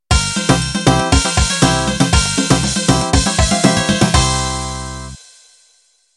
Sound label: Cifteteli End